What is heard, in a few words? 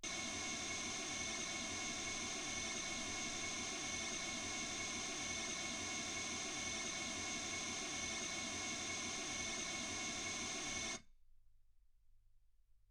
mls16 48kHz